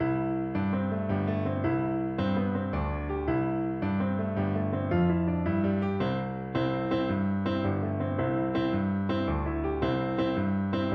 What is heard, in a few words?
jig, cello